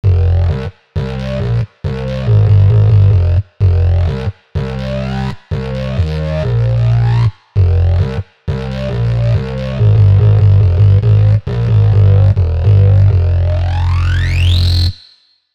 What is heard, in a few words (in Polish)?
Syntezator